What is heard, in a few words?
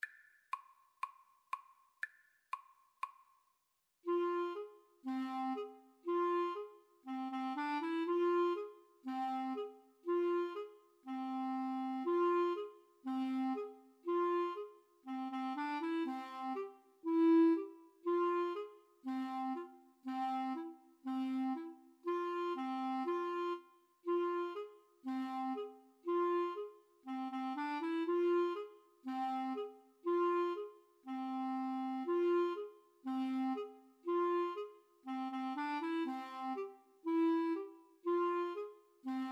Odessa Bulgarish, Clarinet Duet